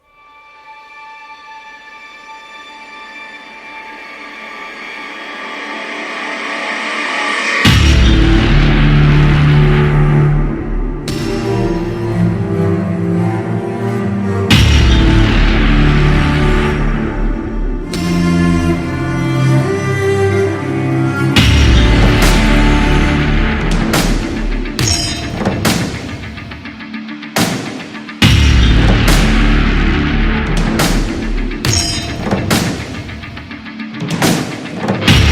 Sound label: Soundtrack-Orchestral Dubstep; Wasteland